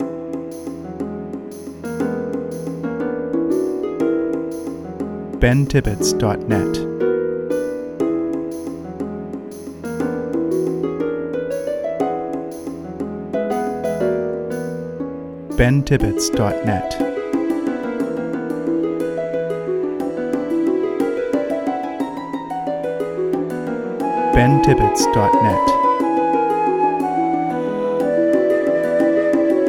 Magical Breeze loop

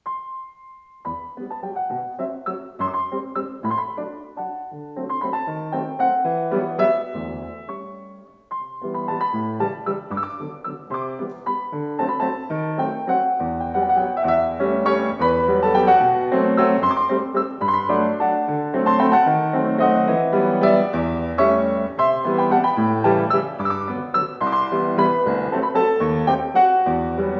waltz

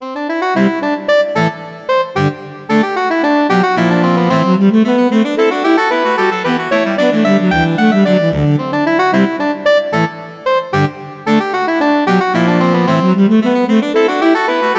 short dance for saxophone quartet

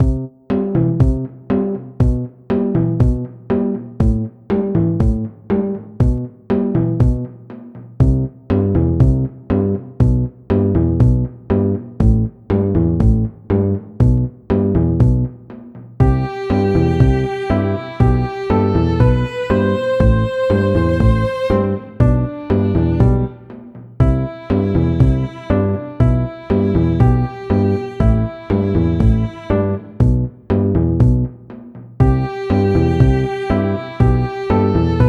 funny duddy, chiptunes

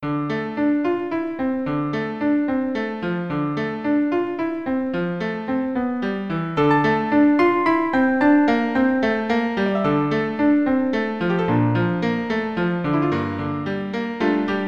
winter a piano version, chiptunes

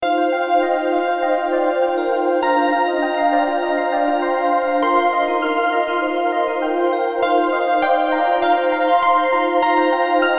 soft nature, chiptunes

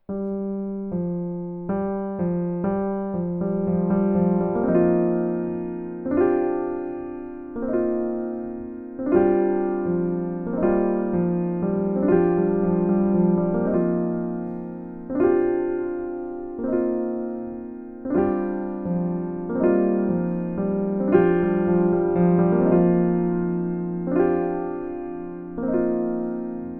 alto sax

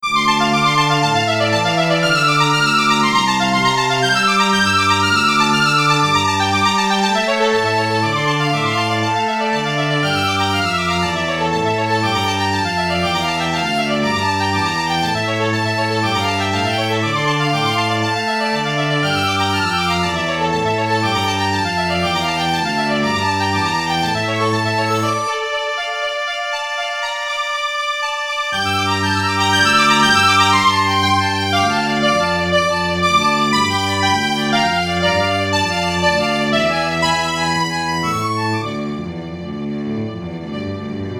minuet for string trio; chiptunes

